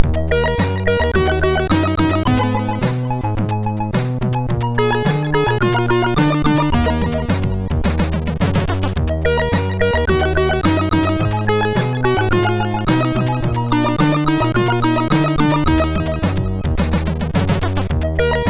sid MOS6581; Arizona 1

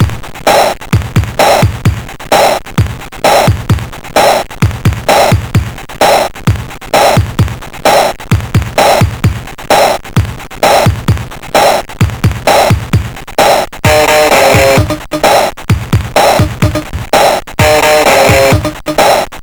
sid MOS6581R2

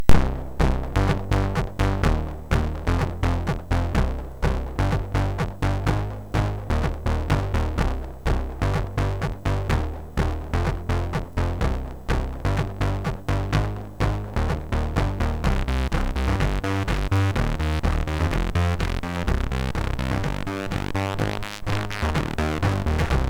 Subbass, MOS6581R3

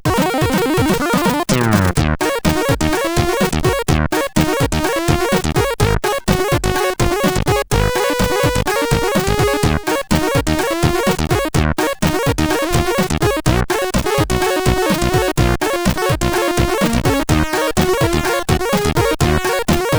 Funk, sid CSG8580R5

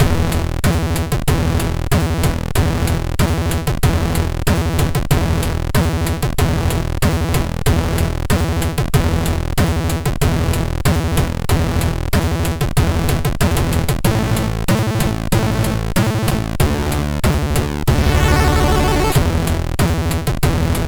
Millitary, MOS6581R3

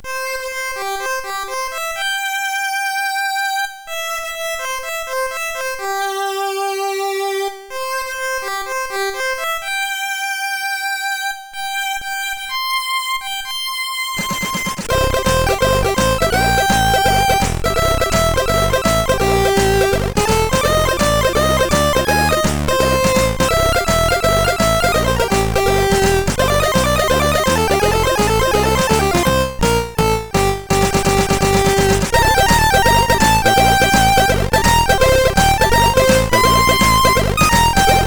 Rock, sid CSG8580R5; Underground; hvsc

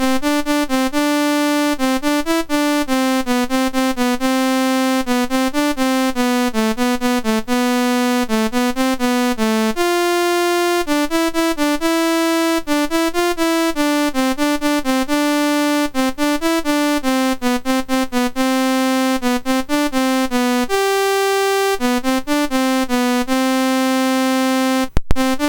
Computer Lullaby, MOS6581R2

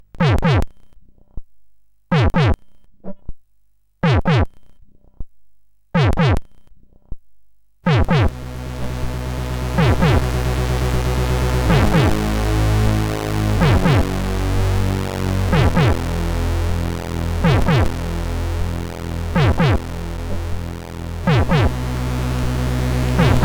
Digital Zakx tune J, sid CSG8580R5

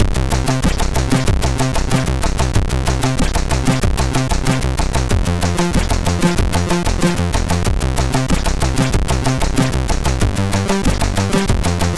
sid MOS6581; MOS6581R4

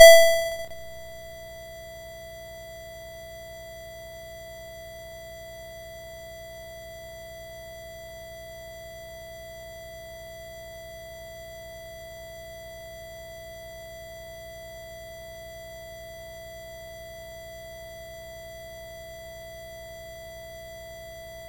BASIC, sid MOS6581R2; Alpine Escape

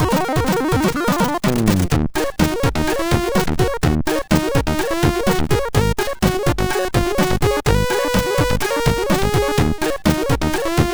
Funk, sid MOS6581R4

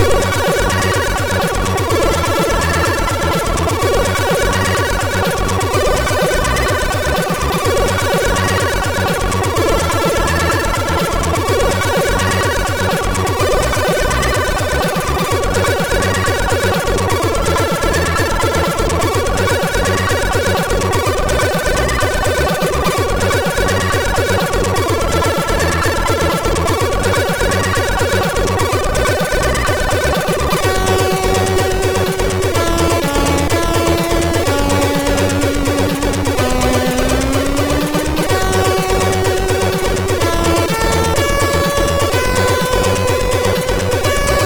Megastyle tune, MOS6581R4; Sudden Death

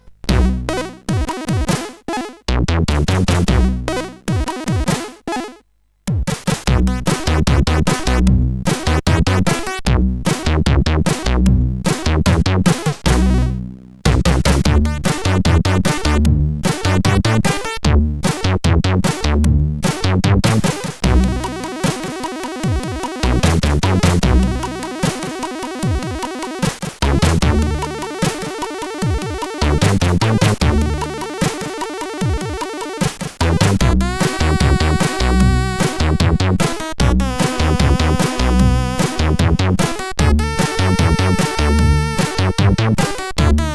Jakkie Jam, CSG8580R5

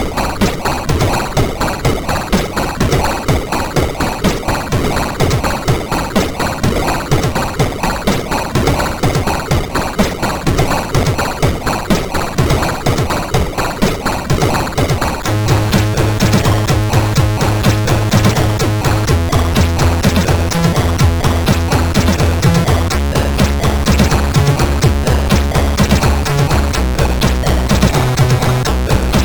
tune, sid, MOS6581R4

